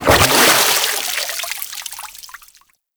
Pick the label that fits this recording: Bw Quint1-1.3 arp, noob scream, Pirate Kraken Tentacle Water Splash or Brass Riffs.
Pirate Kraken Tentacle Water Splash